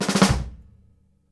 KICK SNARE, Live Snares; PlatinumPhattCD1; E-MU Producer Series Vol. 8 – Platinum Phatt

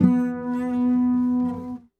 Sarangi Single; Sonic Foundry (Sony Creative Software); Friendly Fire

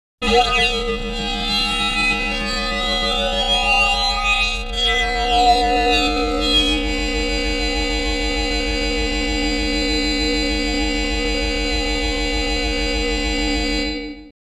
Planetary Machine Transformer; Bizarre Planet Sampling CD

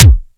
EKICK, ELEC.DRUMS; Crypton - DrumBase5000

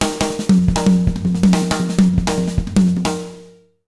BEAT, Jazz Latin Drumloops

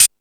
More Cymbals, E-MU Pro-Cussion

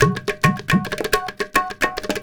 India, Heart of Asia

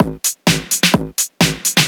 Straight Loop 128 BPM, House

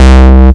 Basses Root C

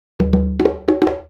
Conga Fill; Essential Percussion

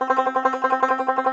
T. BANJO, Tenor Banjo, Plucked Strings

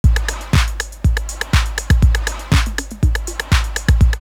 drumloops, Techno House, 121; Inter-Face; X-Static Goldmine

